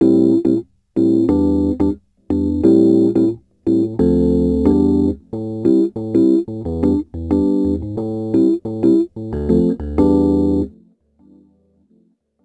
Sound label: Rhodes Attacks; Ueberschall